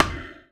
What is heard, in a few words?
Ball Hit Med Breathy; Spinal Serve